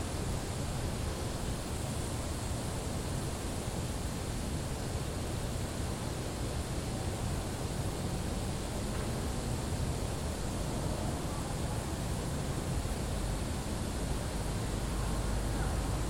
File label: in front of Carnegie soundscape